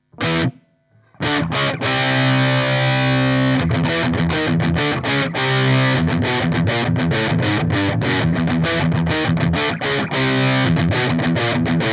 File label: LP HiGain; lampes